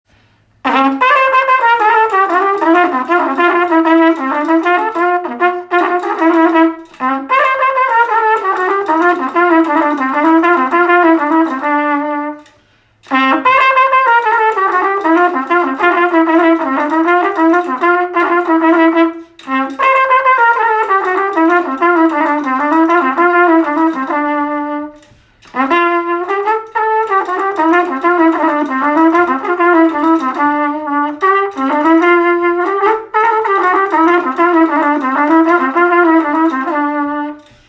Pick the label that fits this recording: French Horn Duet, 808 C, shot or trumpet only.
trumpet only